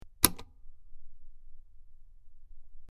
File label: Button Large Button P, switch clicks